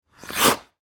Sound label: Sports Ice Skates Scrape Ice Stop; Ice Skating (Sound Effects:Sports)